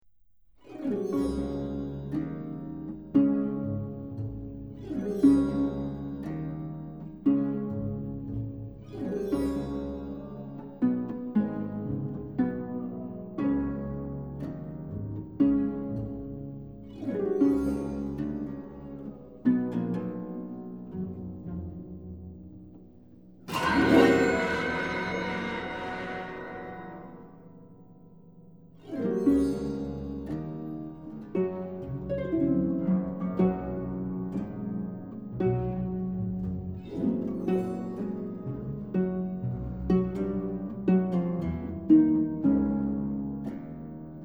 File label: Harp And Orchestra